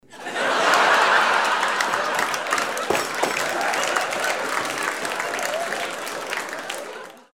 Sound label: laugh applause table